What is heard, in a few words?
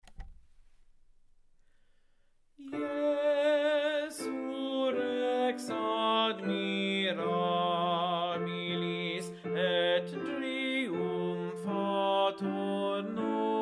Baritone; Jesu Rex Admirabilis, G. F. Palestrina